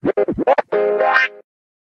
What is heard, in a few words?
stutter, notifications; Sony Xperia C3